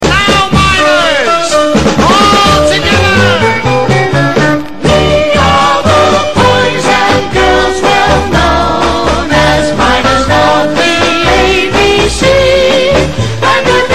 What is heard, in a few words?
abc song